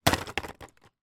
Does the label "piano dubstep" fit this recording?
No